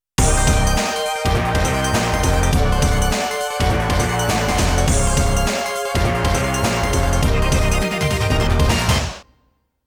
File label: Round Clear Long